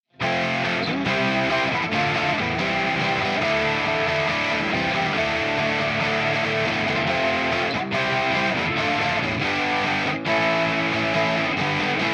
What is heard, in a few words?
808 chords modern; ampsims; tse